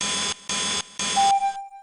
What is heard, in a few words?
Lock On; battle anims; pokerogue